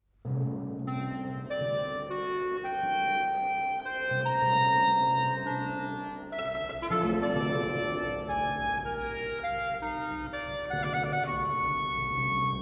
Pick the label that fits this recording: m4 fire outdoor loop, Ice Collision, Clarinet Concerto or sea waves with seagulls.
Clarinet Concerto